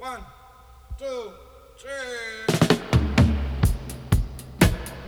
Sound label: 132 FILL DUB, DRUM LOOPS, 132 BPM; Drumdrops In Dub VOL-1